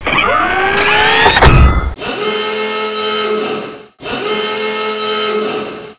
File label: siren